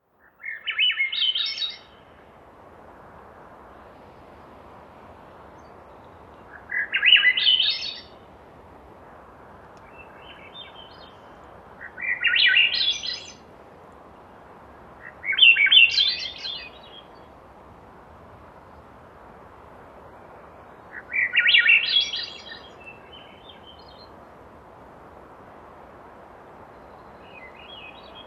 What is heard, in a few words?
thrush